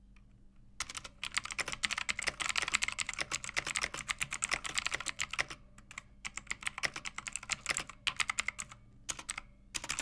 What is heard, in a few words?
clicky keyboard stereo; gaming keyboards